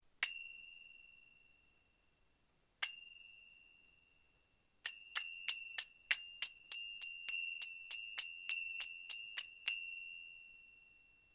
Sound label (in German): zimbeln ind messing